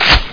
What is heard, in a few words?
lock, weapons; Quake; PC Gamer 51